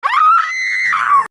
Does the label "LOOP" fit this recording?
No